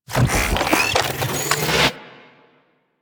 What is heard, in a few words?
Biped Stomp Charge; Warframe; Corpus; Ostrich; Shockwave